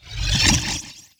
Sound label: Teleporter Nebula Cloud Exit; Evolve; Wraith